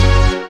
SYN, dance; Sound & Vision - Gigapack II

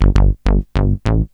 SBASS; USB Soundscan vol.31; Miro s Grooves; AKAI

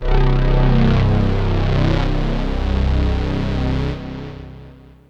Synth hits and riffs

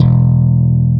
5STR BASS E1, Bass Guitar; AKAI S6000 CD-ROM - Volume 1

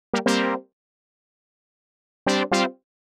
120 Pad riff C, Keys, Nu Jazz House; PowerFX

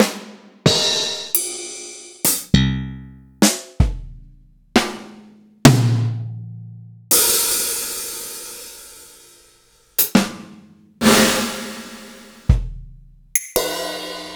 Roland XV 5080; m8

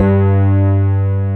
RHODES, JV 1080; Pro-Rec - Synthsonic

